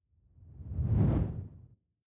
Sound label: whoosh simple flutter low